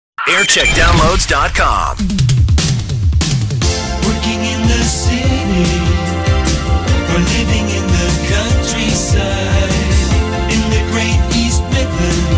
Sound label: Image Song; Gem AM